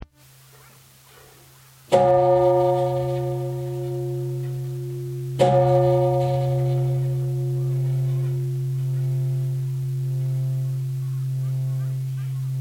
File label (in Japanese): bonsyou sound